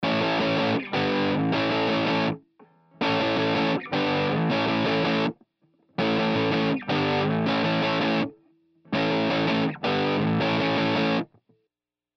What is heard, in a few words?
WGS British Lead